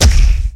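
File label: earthshatter attack; Firestone Idle RPG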